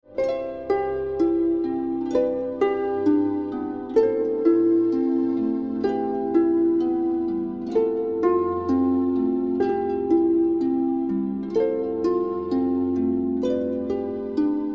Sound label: Magical Harp